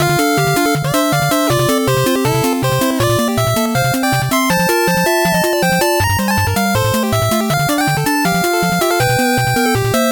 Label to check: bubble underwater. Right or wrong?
Wrong